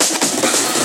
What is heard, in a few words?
Fills, Loops 140 BPM